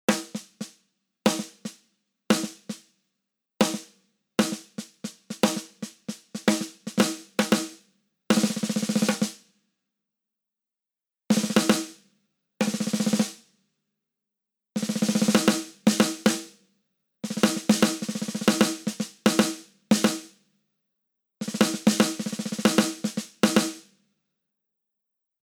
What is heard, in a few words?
snare wet